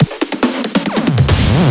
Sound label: Fills, Loops 140 BPM